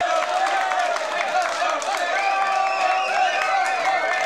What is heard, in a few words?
crowd loop mono